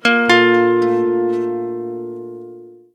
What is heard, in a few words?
Thinking, notifications; Huawei Honor 3X Pro